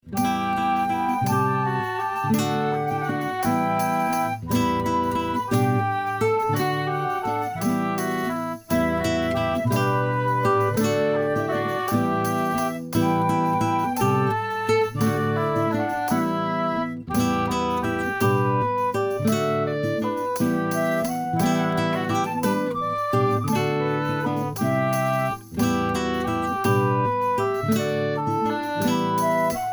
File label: Folk; Taverley; RuneScape